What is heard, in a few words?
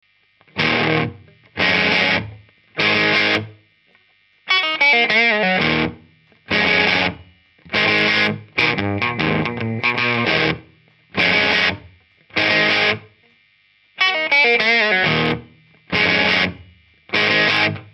crunch lourd telecaster; guitare; ampli 12AT7 + ECL82 SE